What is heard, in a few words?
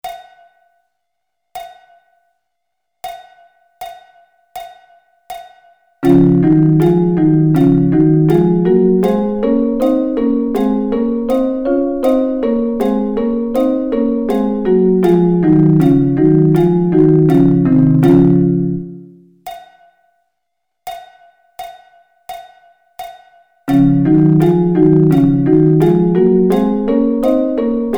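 Double Stops, snare drum; Db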